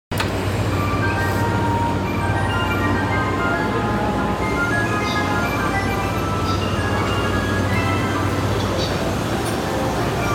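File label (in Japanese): 山手線品川 clockwise, train sounds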